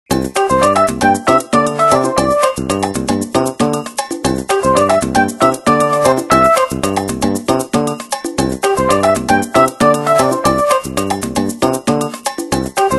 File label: Ring Carnival, Windows Mobile ringtones; Individual download-only (2008)